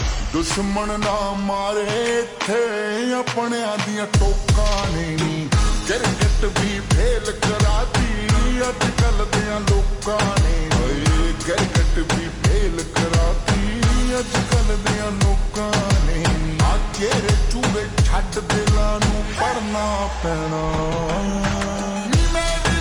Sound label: Slowed Reverb Ringtone